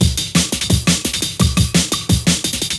breaks; M8

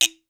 bamboo, pop rock set

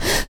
HIT, Jazz FX FEM PERC

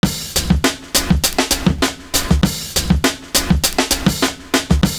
GOOD OL BEAT, LOOP; Univers Sons

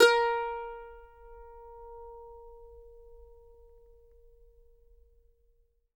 MANDO NOTES, A#4; Sampleheads; Whole Lotta Country 1 & 2

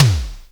Soft Tom; Roland MV8800; DAANZ KICKS

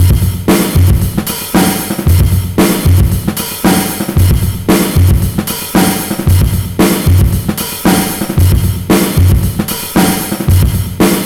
ROCK HARD, 114 bpm, Breaks; POTBreaks

